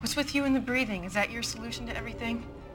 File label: breathing; Chloe O'Brian: "What's with you and the breathing?"